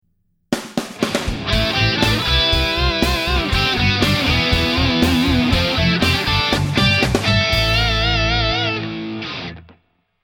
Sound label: 5th harmony